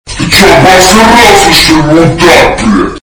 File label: fart; pvkii